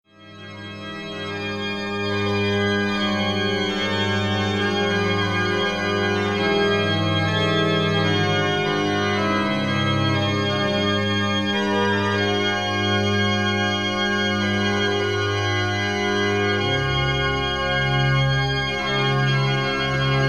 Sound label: Plein Jeu; Houdan; Clerambault